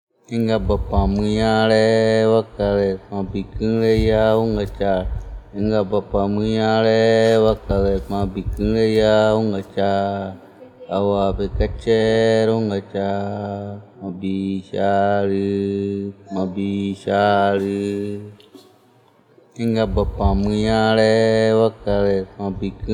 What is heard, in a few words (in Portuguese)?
Canto da Onca